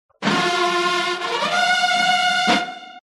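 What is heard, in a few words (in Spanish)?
ATENCIÓN, TOQUES MILITARES; SECUENCIA SUGERIDA DE ACTO CÍVICO; MATERIAL CÍVICO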